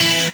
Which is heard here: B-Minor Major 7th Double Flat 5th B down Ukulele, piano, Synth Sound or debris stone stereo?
Synth Sound